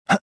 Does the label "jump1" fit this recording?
Yes